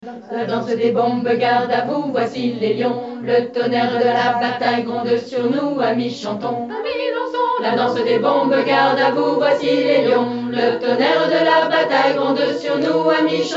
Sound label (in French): voix mediane